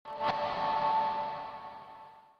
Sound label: Guitar